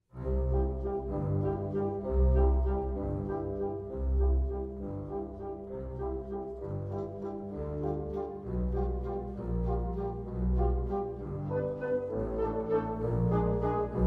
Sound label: piano